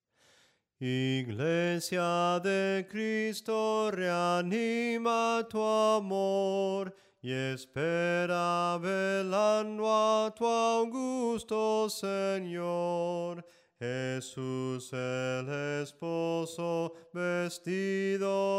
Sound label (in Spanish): SOPRANO CANTADO; cantos del camino